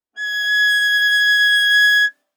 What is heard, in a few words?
Viola arco ff sul A Ab6 stereo; MIS Pitches - 2014; Strings